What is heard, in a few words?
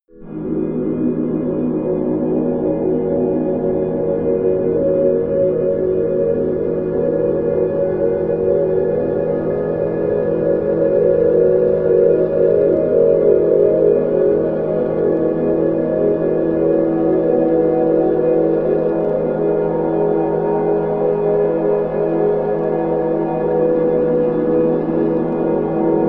wavy clouds, music elements